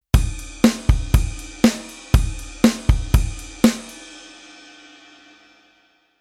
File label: Rhythm Basic Velo HH Ride